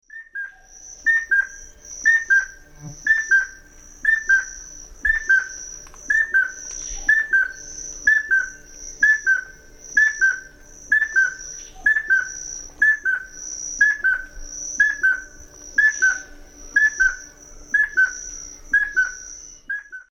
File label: Anurolimnas castaneiceps, song; S. America; Eastern Lowlands